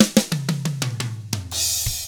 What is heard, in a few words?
116 SHUFFLED, Drums; ILIO; Double Platinum Drums 1